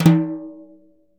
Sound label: Synclavier Percussion, World & Orchestral; Synclavier Percussion 2; ILIO